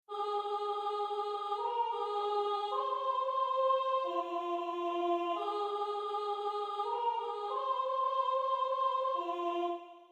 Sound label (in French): voix